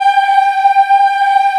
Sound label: G4 child, Choir; CHILD IHF